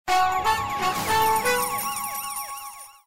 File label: EFX JACKPOT ANIM, SLOTS; GangsterAlley